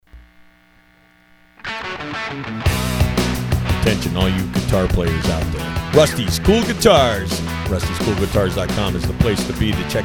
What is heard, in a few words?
Cool Guitars